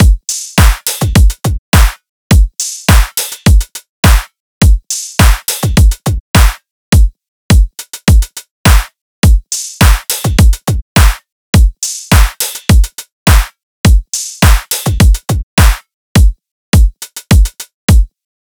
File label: Drum Loop 104 BPM, Midtempo; Blossom; Cymatics; ANALOG EVOLUTION Beta